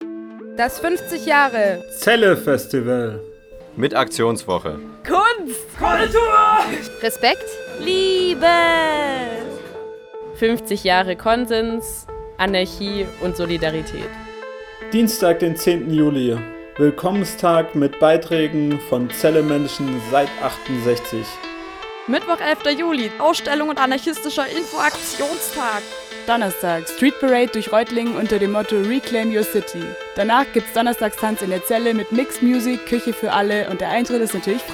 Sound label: JINGLE; ZELLE